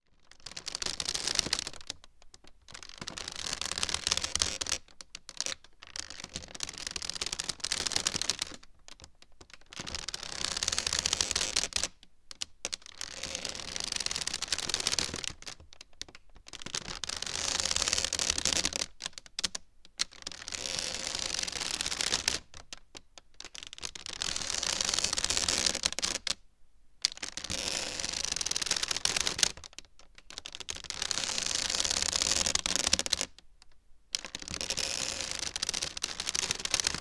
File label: foley wood squeaks swing could be hammock